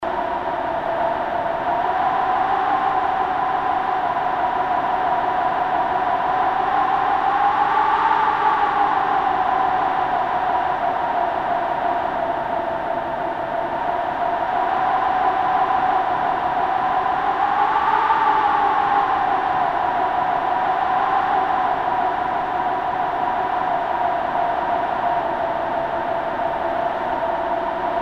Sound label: Blizzard Wind